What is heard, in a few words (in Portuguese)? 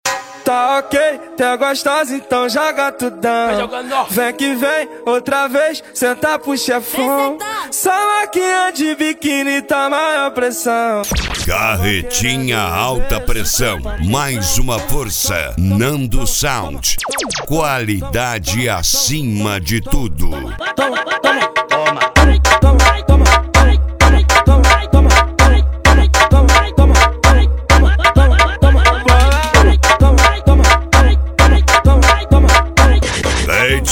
ritimada agressiva